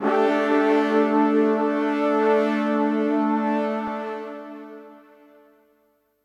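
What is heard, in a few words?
synth electro 140 A; keys; Techno2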